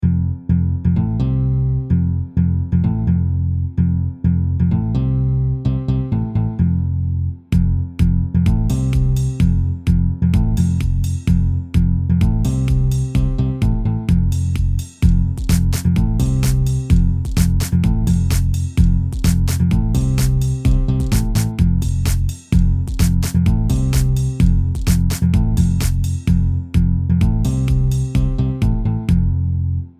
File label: Simple Guitar